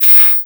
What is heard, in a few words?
One Shot Shaker, Drum N Bass - Percussion; Sonic Foundry (Sony Creative Software); Knutrix Drum & Bass